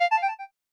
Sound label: clarinet star, keytone; SKY; IM-T100K VEGA No.5